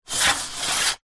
industrial joist metal small sliding extend

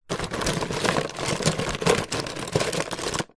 office tub pens pencils rummage

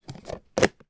food margarine tub lid close; sound effects one

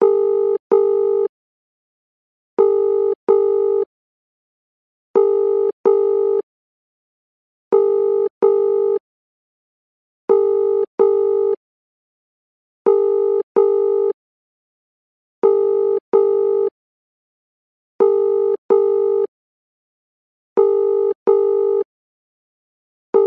household telephone UK ringing tone; zapsplat

